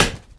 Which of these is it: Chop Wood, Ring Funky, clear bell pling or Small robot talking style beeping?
Chop Wood